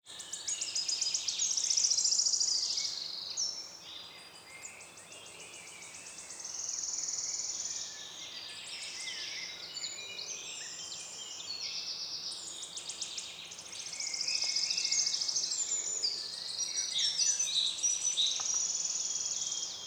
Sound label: Forest Serenade; PomoLuxe